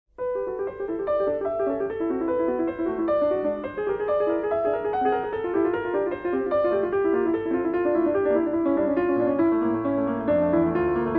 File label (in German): 6 Stuecke fuer Klavier